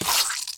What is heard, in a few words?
whack